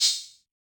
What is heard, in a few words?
HH, Yamaha DJX 2B